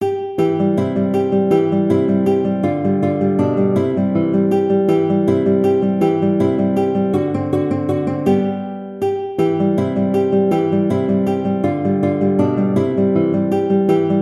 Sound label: Waltz guitar; Carcassi